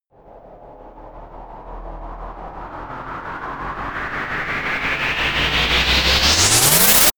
power charge

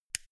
mini switch turn off

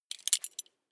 metal belt buckle clatter heavy

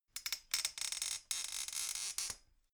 folding chair creak

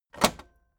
tape deck close heavy

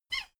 folding chair squeak short

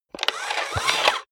robot movement fast

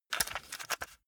cassette rattle short

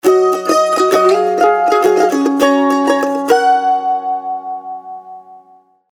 lovely chords

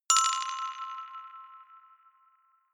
notification glockenspiel rattle third

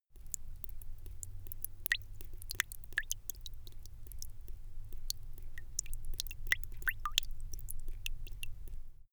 drip

